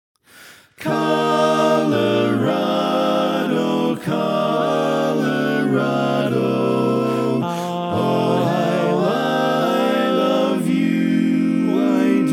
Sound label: Tenor Left